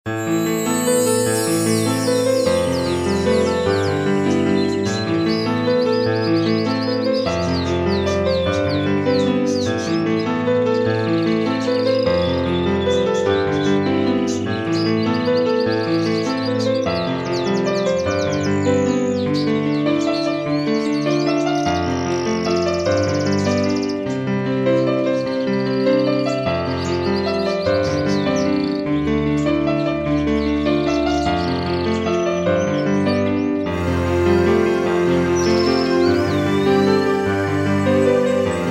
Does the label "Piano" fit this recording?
Yes